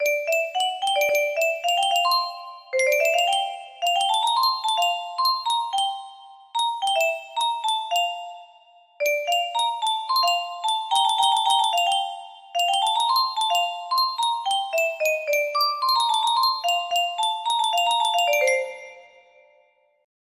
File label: music box